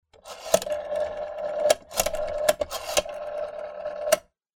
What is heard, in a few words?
dial phone